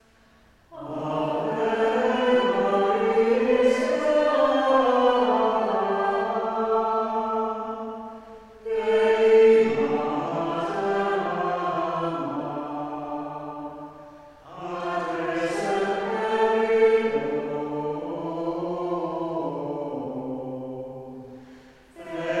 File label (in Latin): ave maris stella chant & organ